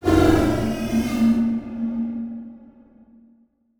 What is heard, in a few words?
Transition Whoosh Fly By; Production Element; Title Transition; AdobeCC